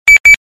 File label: Tone; Nextel